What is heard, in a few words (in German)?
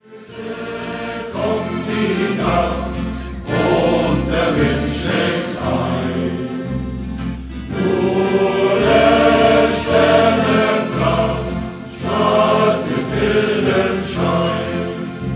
Shanty Chor